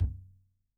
FLOOR TOM, ELECTRO KIT

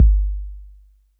Roland 808 BD

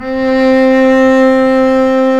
STR, Cb Bowed, Cb2 f vb; String Master Series

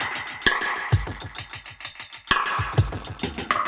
Drum Kit Echo Kit 130, dub drums samples, 130bpm; musicradar

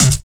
BD, 160 BPM; Ueberschall; Drum & Bass Flash Trackz

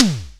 SNARE, Simmons SDS5

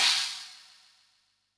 SPLASH, Yamaha DD-65 CYMB

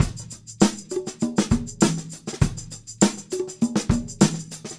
Combo, R&B Drums

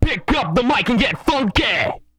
GET FUNKY, HIP-HOP, R&B Dance, Vocal; TB HIP-HOP

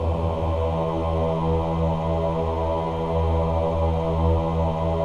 CHOIR AHS, AH E2; Spectrasonics; Symphony of Voices

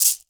Shaker; Equipped Music; Loungin House

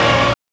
SYN TECHN, dance synth; Sound & Vision - Gigapack I CD 2

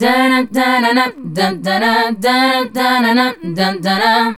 Female Group, Vocal

